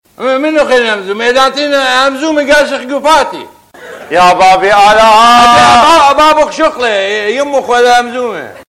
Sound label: crank ringtone